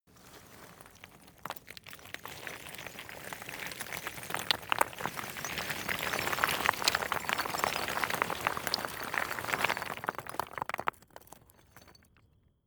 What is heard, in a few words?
stone large landslide; natur-tiere; steine